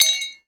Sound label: Metal Ting; 1001 Sound Effects